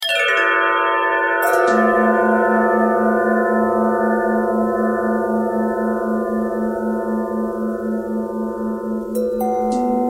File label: bell bendtwist